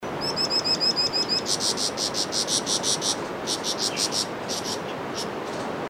Gray cheeked Fulvetta

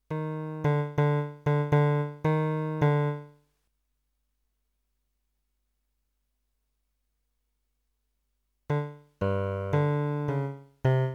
damer bas

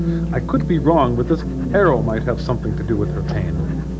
arrow

